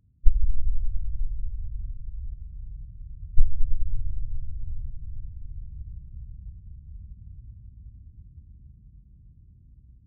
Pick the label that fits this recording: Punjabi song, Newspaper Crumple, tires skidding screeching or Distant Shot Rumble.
Distant Shot Rumble